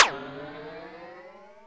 Riser; Classic SFX; musicradar; cartoon caper

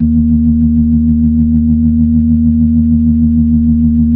EB2F, B3

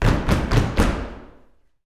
YOUTH FEET, SLAP STOMP; Spectrasonics; Vocal Planet; Blues Soul

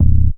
TG BS C2, bass; Q Up Arts; Dream Experience

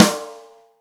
ROCK SNARE CHROMATIC, Real Drum Kits

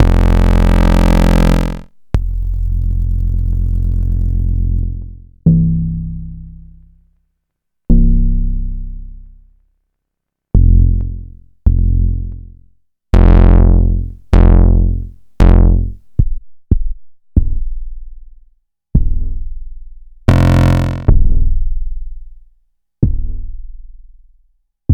808 Experiment

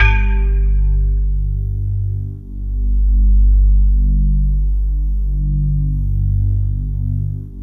PERCP, PERC PAD; Sound Gallery - Charged

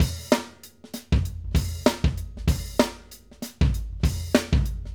Acoustic Live Drum

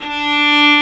viola, 3-D pads; 3D Multis

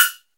FX PICCOLO; E-MU Formula 4000 Series Vol. 2; OrbitPercMaster

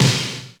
ROOM SNARE; Crypton - DrumBase5000

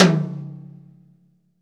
TOM, GM drum set; AKAI S-Series CD-ROM Sound Library VOL-8

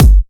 Dubstep Kicks; Bassdrums; Vengeance - Essential Clubsounds Vol.5

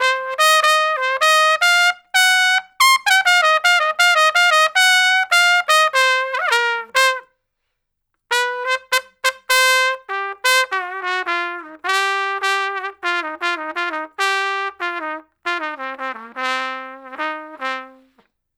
Trumpet Solo, Jazz Traditional

